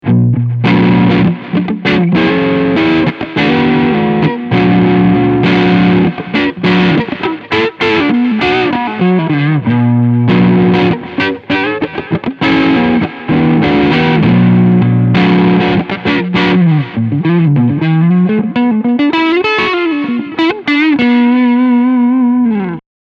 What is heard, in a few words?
Front PU Crunch